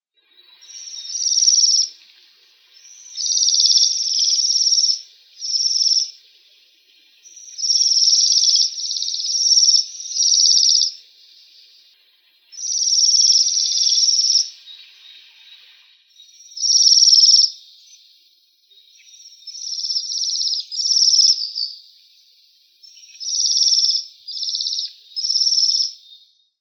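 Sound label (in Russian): sviristel, waxwing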